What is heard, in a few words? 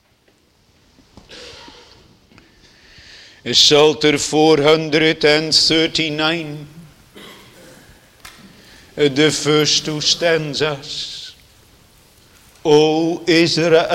Singing; The Lord's Incomprehensible Goodness